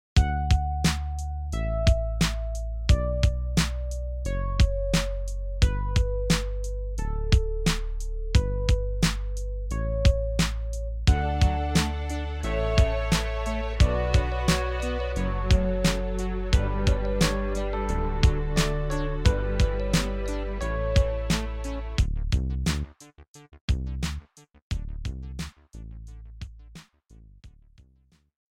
pachelbel rap

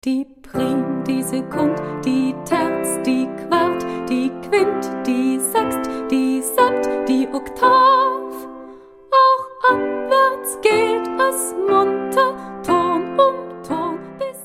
Das Intervall Lied

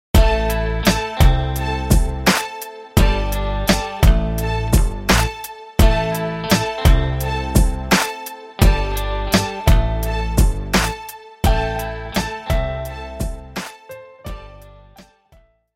Musikstile Rap Pattern Ausschnitt; Musikstile in Pop & Rock

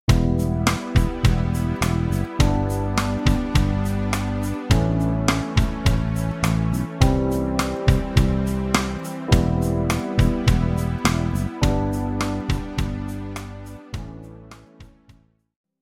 Hoerraetsel Akkorde Ausschnitt

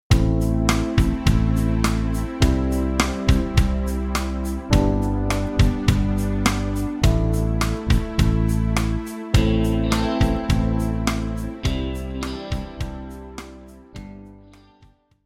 Raetsel Track Akkorde Ausschnitt; Musikstile in Pop & Rock

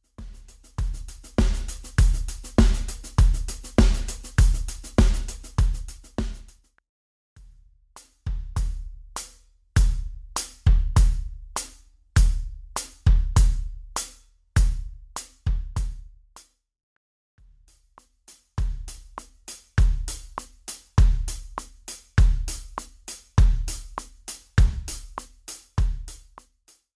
Notenwerte Hihat; Hoertraining

